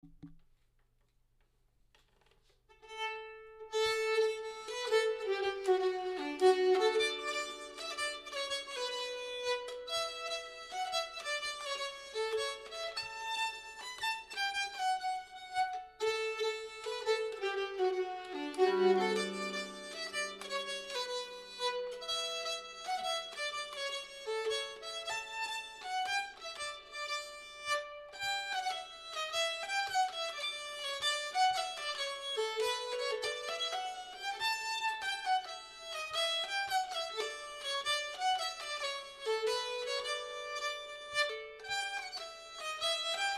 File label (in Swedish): polska; karisperspolska